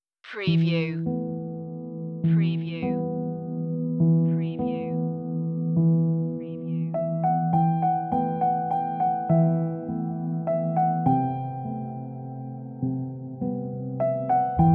raindrops, romantic nostalgic

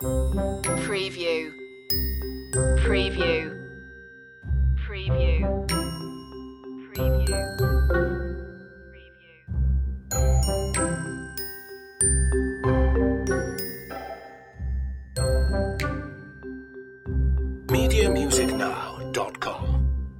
loop, tongue in cheek

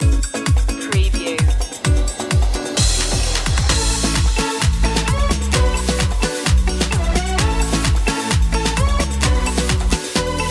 tropical party stinger; dance; aerobics

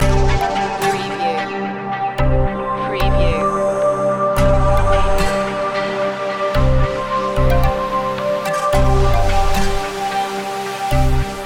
deep in chill loop; chillout grooves

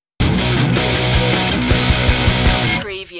stinger, Rock Surf-Rock

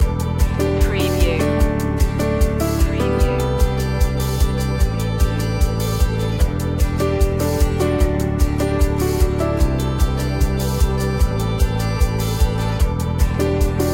start new day loop, Country Ballad

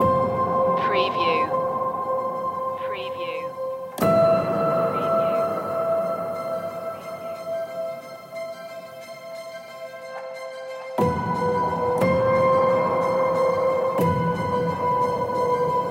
a new movement loop; adventure fantasy

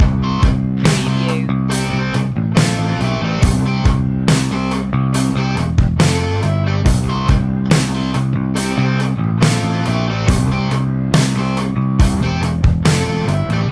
dirty mud loop, loud

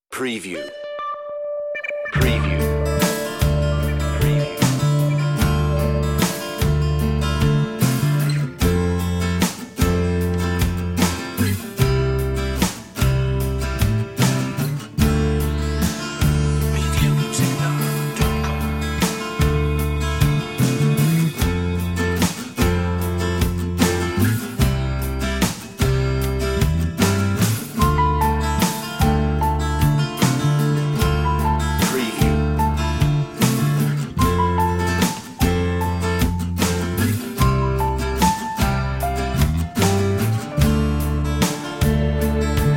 Secret Place, on-hold music

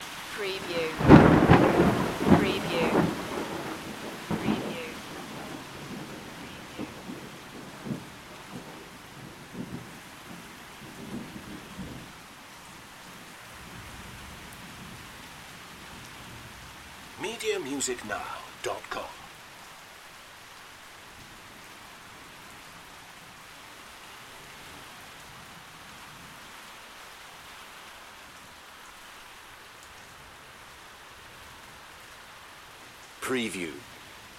rain below canopy; thunder storm; weather; lightning; the sound pack tree

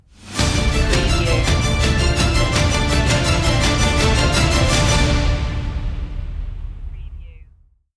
stellar orbit bumper, movie

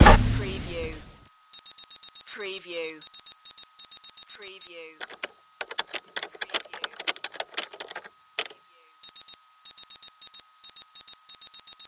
Data Entry Sequence; Sound Effects Bible

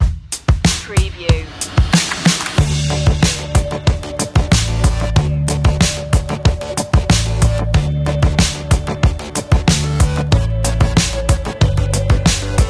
A cool and relaxed reggae track made of deep bass; urban rnb; smooth beats

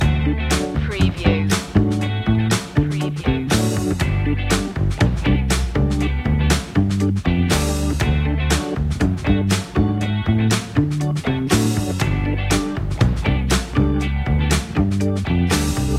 check it out loop, funky groovy

